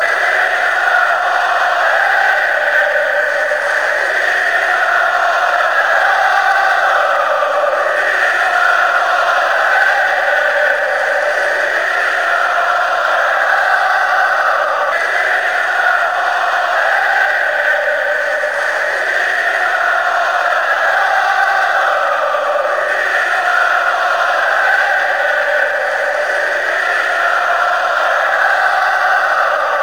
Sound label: baby, fanchants; peter pawlett